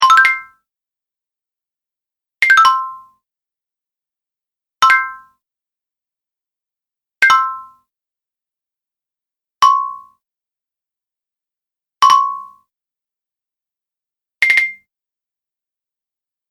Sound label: Menu Sounds Collection, tech sound effects; sound design